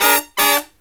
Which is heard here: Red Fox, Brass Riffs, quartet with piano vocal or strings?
Brass Riffs